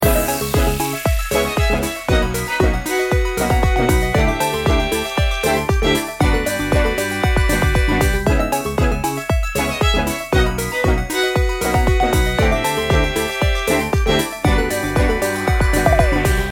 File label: Victory loop; YIIK A Post Modern RPG; 2019 switch gamerip